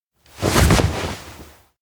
half spin fast; anemoi

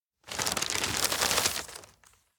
map unfold; anemoi

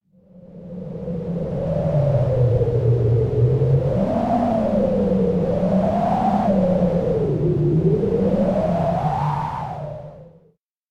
elec wind low growl; anemoi

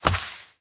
watermelon throw; anemoi

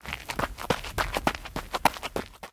footstep run dirt; anemoi

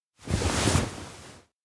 futon try hide under; anemoi パッケージ版 1.1

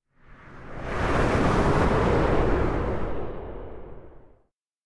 seabreeze stronger; anemoi

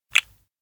rubiks show spin; koyomi; anemoi

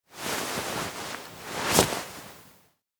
take carrot; anemoi パッケージ版

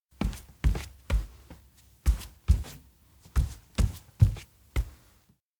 steps indoor log house pace; spica; anemoi パッケージ版 1.1

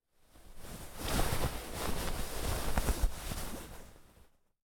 cloth wakeup; anemoi

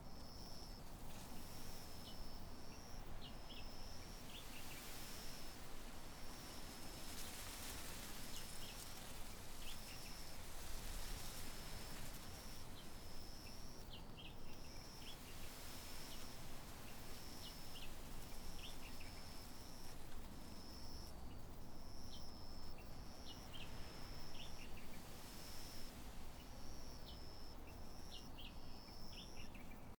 outdoor school night

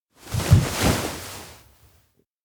hug; kurumi; anemoi

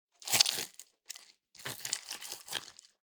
Eat fried food; anemoi

